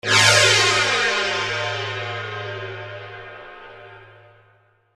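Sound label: magic cymbal; ecrins; Mars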